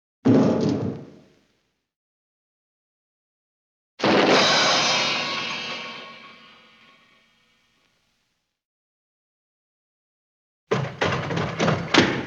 body fall; JERRY APPS WINTER

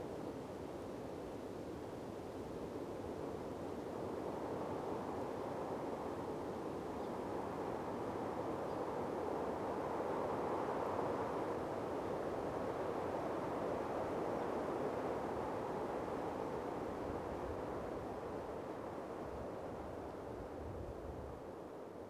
Wind Light Steady Wind; Poetry Project

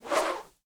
Whoosh, Arrow, Feather, Slow; Universal SFX

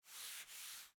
Swipes

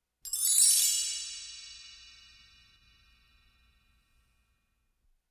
Bell Tree Accent Fast Up; Electa Quinney

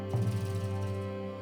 Music Only

